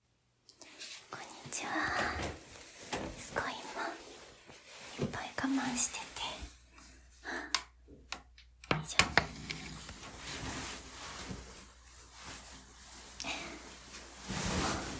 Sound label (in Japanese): おしっこ実演 我慢してからのおしっこおならを添えて編